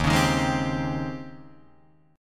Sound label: D sharp 13th, Guitar, Drop D